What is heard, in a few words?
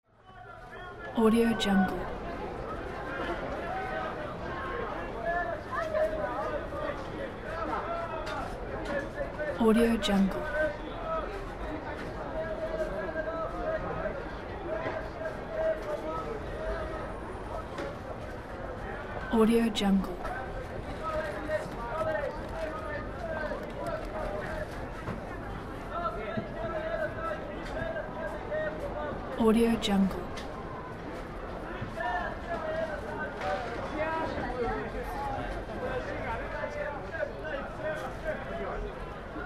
bazaar ambience